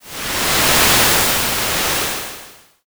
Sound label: wave